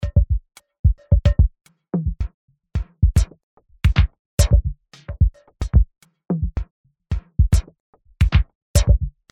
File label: House Loop; Arturia CMI V; Efx FRAGMENTS; House Loop Maker